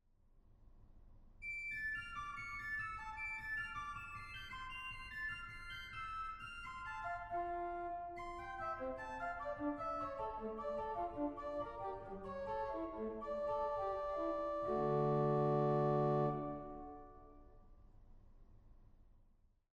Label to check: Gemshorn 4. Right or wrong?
Right